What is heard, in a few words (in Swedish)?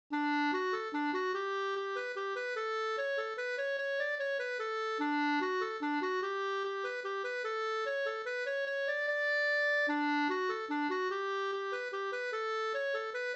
mazurka; e Albert Lantz Sanne Bh